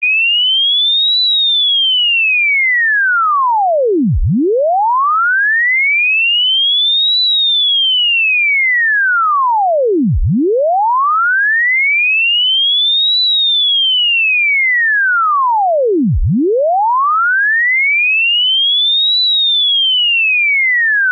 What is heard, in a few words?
Swept Sine 20-4KHz